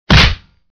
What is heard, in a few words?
punch